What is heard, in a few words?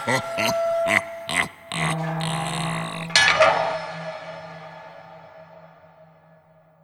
hahaha door lock mosnter